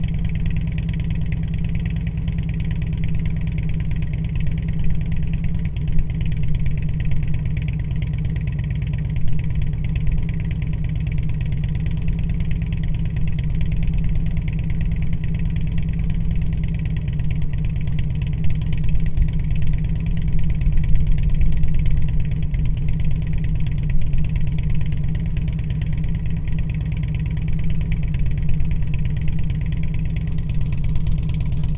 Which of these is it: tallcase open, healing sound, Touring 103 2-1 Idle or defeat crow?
Touring 103 2-1 Idle